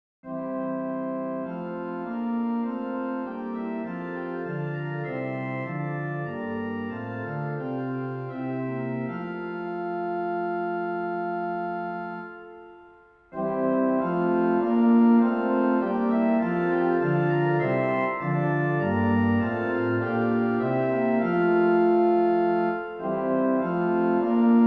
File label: Organ